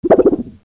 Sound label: spring